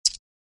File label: chip land